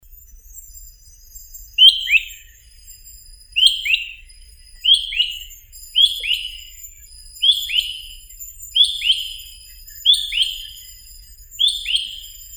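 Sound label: broad-winged cuckoo, Hierococcyx fugax; kukushka shirokokrilaya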